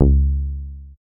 Bass

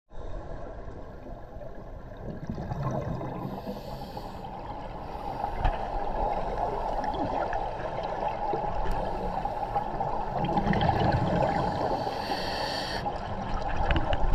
Scuba Diving Breathing Apparatus Bubbles

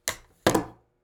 Phone Cordless Plastic Put Down On Table

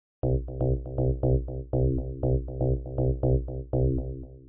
Bassline

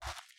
Soft Grass Foot Step

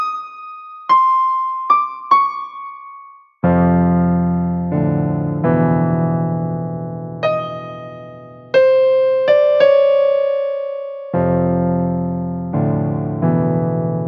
Creepy Piano Entry

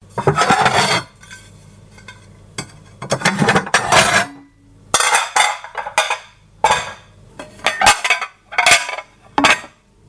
Plates

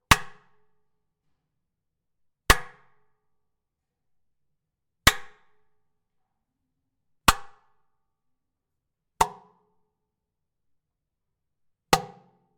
Plastic Reverb Claps

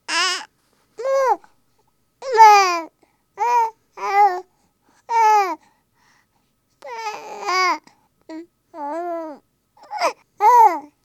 Babbling Happy

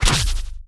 Energy Short Sword

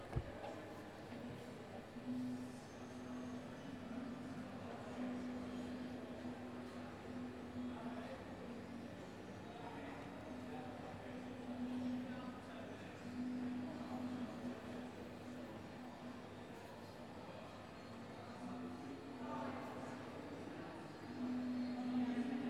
Coffee Shop; Costa

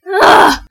Exasperated Growl

